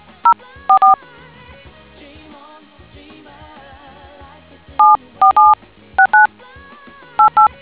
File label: dtmf